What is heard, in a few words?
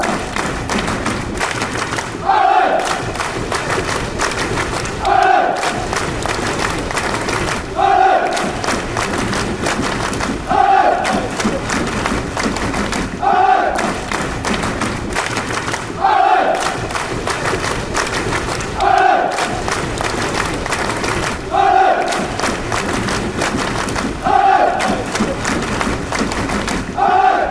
ireland clap, fan chants